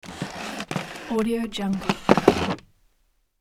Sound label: chair wood scrape; hollywoodedge